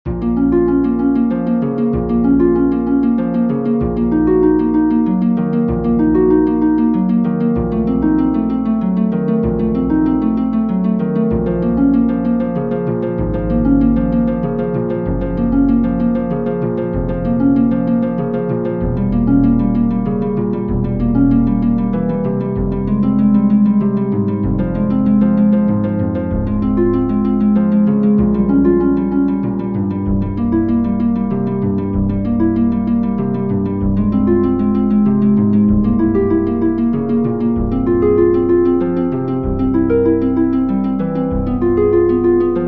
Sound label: praludium minor for harp; Johann Sebastian Bach